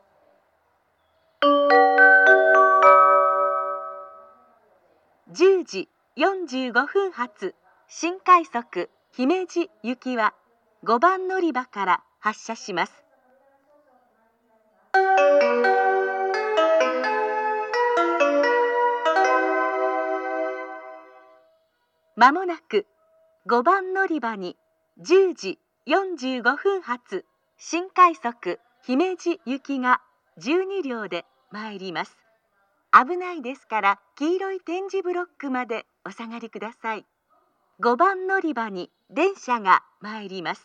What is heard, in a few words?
special rapid service, kyoto station; himeji